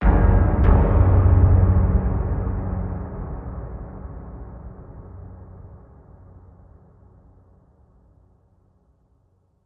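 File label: Hit Hor, horror hit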